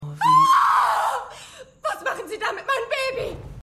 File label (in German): Schreiende Mutter